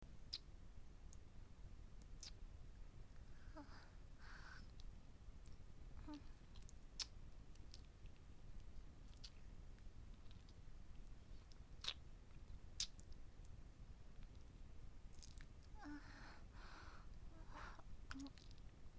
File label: kiss